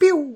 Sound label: pew